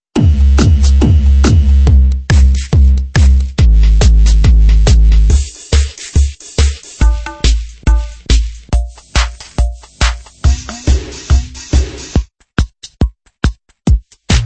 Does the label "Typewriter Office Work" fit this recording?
No